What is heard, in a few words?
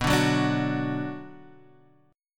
B Minor B